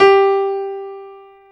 PIA PRO, Roland; Sound & Vision; Gigapack I CD 2; PIA GRAND 1; PIA Grand 3

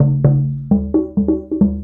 Mallet Drum; Sonic Foundry (Sony Creative Software) - Essential Percussion

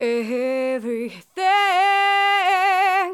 Female vocals and robo-vox

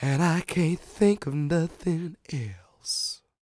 SING, Vocal Planet Blues Soul BLUESY

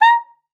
sax a#5, Saxophone stacc piano

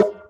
Conga; Equipped Music; Loungin House

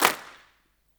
GROUP CLAP, HANDCLAPS; Spectrasonics; Heart of Africa

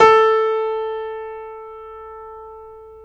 PNO, Grand Pno, Roland; Club-50 - Foundations Roland

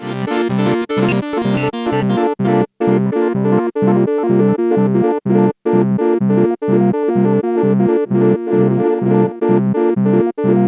sweep, ambient atmospheres

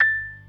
PERC D 4; B3 FULL

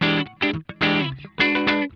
COOL JANGLE, Funk Guitar; Zero G - Funk Guitar

